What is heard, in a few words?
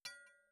impact concrete; insurrectionist